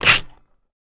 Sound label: Typewriter Paper, typewriters